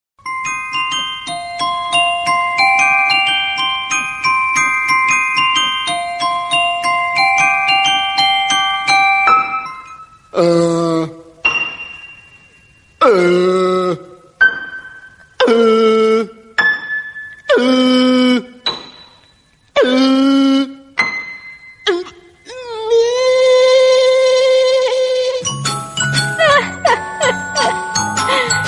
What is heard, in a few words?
calm peaceful background cell phone tone ringtone; nokia ringtones